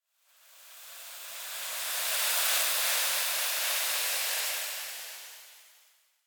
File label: Clan Dojo Sand Gust; Warframe; Dojo Randoms; Ambience